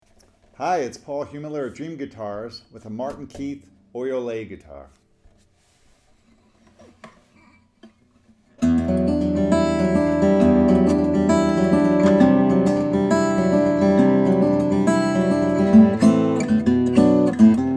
steel-string flattop guitar; martin keith auriole

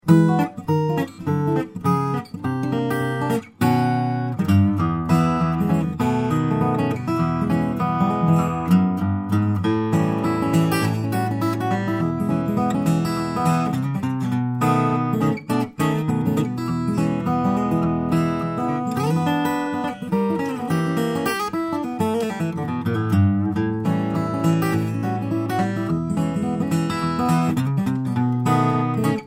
concert, steel string guitars, flattop

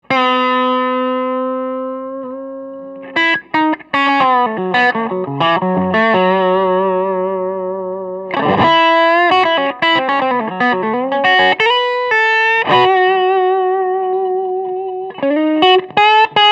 PRS Hollowbody, hollow body electric guitar; private stock; mahogany; maple

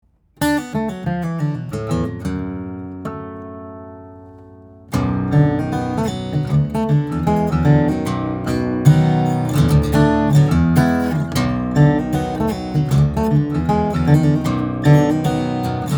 Grand Jumbo Baritone, steel string flattop guitar; mountain song; od-j; african blackwood; swiss moonspruce; ODC-J

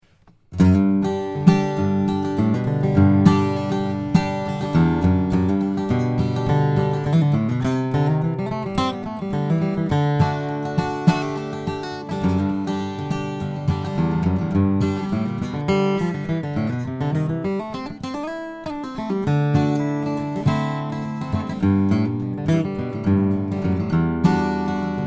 d-12 short scale, steel string guitar; santa cruz

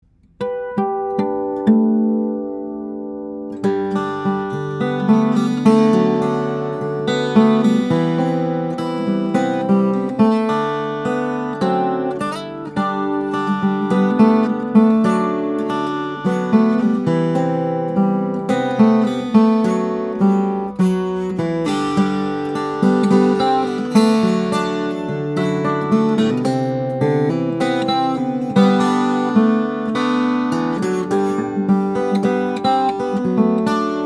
guitars; Greven SD 12; maple; spruce; steel string guitars; flattop

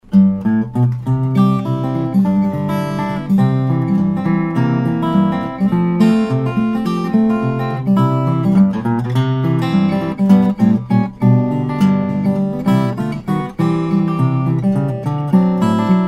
00 12 fret, steel string guitar flattop; slothead; foley

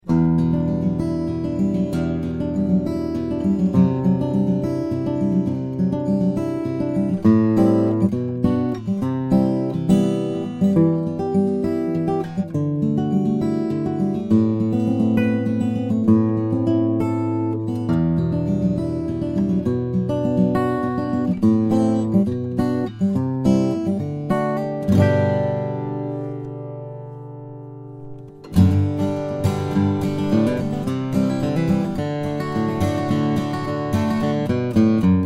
jumbo, steel string guitars flattop; franklin

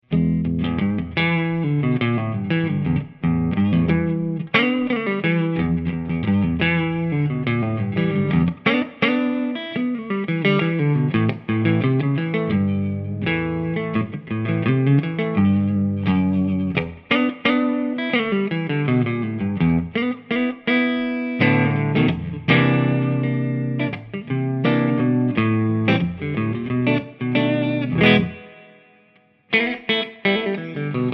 Spalt, electric guitars; the nouveau series pissarro in the field no 2; spalt instruments

